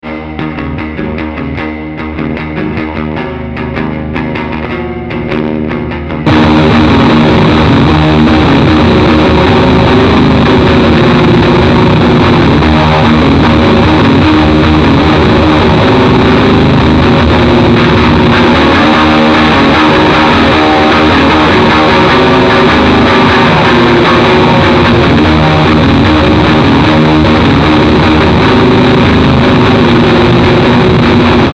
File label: riffer; apocalypse